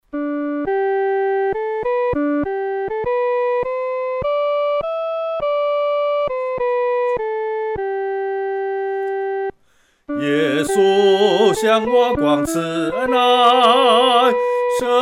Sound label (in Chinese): 独唱 第一声; 主爱说不尽